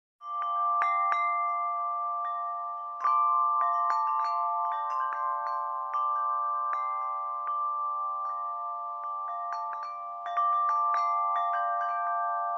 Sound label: Pentatonic Chimes